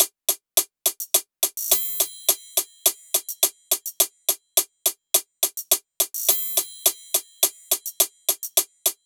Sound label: hat loop 105, Drums; Pop; Hat Loops; greenhill; Slate; SD PASADENA Pop Sample Pack